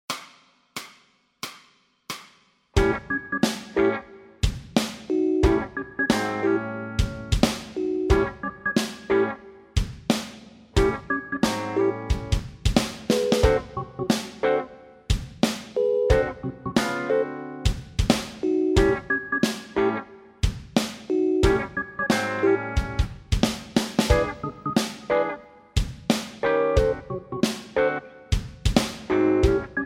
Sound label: bass blues funk, backing tracks